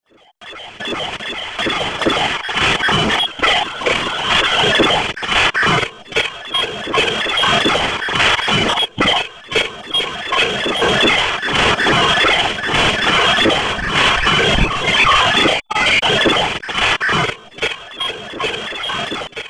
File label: Intercepted Transmission